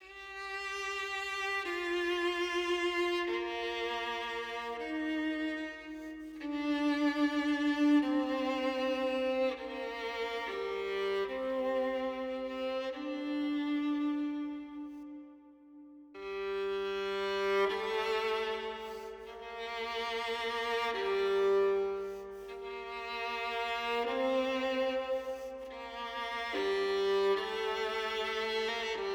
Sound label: Violin Version